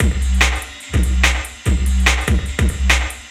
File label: Breakdown Beat, Drum N Bass; Sonic Foundry (Sony Creative Software); Knutrix Drum & Bass